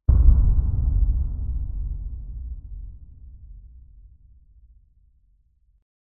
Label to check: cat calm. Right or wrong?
Wrong